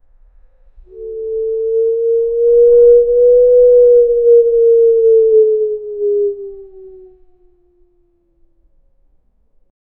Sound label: jet